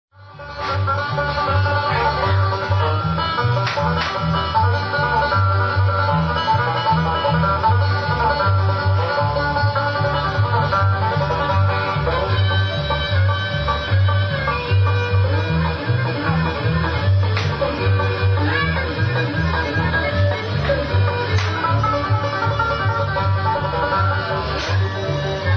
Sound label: banjo; blackgangchine